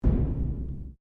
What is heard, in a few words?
Strike Metal Hollow Tank Soft; Materials